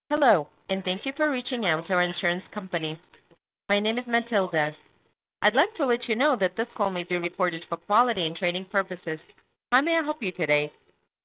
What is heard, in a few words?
Babble noise; Sanas